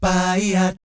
BAYEEAT, Vocal Planet Jazz Fx; Spectrasonics

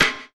SNARE; E-Lab; X Static Goldmine 2; akai

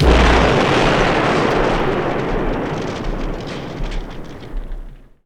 FX BOMB; E-Lab; X Static Goldmine 2; akai

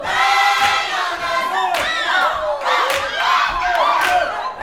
Vocal Gospel PHRASE

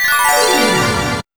SYN RUN

